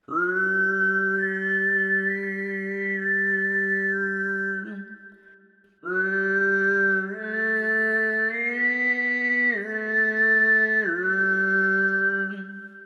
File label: Eighth overtone in parallel among G A B fundamentals; ottavo armonico in parallelo su fondamentali